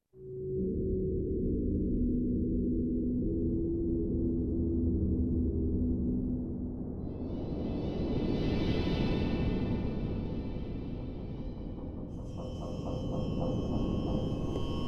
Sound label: Amb Outdoor